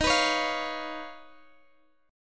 Eb 7th Suspended 2nd Sharp 5th Eb, Guitar Half Step Down